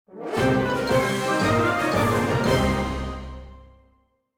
Fight Lose; mighty party 2017 gamerip pc ios android

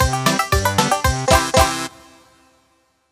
Collect Jiggy

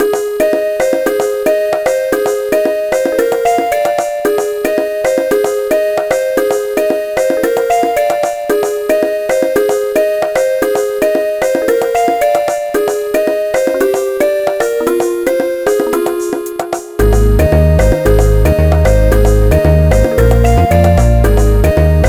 Bonus Level; Hudson Selection vol. 3 PC Genjin

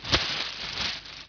Rustli; Buggy; PS1

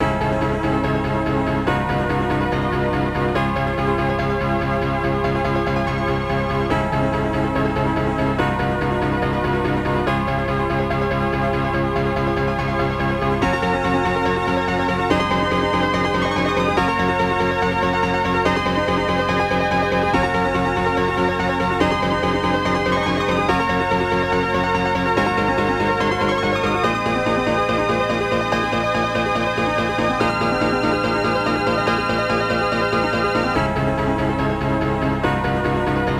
Mega CD - Startup

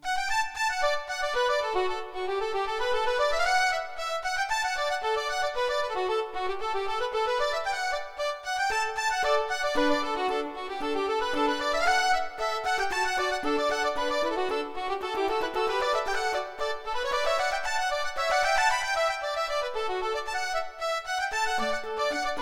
Little Fiddle; Sid Meier's Colonization

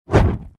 strike skill sfx; Animation Throwdown

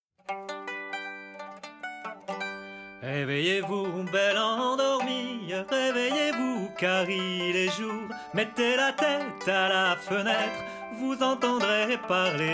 Tavern; assassin s creed unity gamerip 2014

